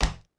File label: Char Fs Sprint Wood; We Happy Few